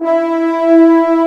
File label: ATMO SW HORN, Brass Wind, POP BRASS; AKAI S6000 CD-ROM - Volume 1